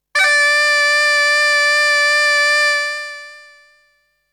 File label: Flute; ZERO G - Datafile DISC 3